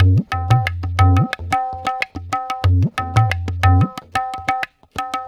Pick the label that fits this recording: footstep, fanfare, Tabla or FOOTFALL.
Tabla